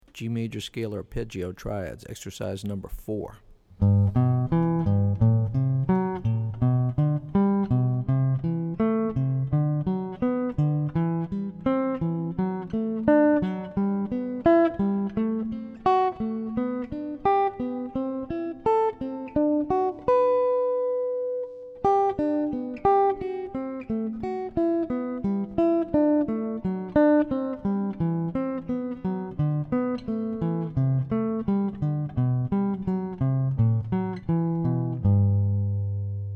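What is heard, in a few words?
G Major Scale Arp Triads; lead skills materials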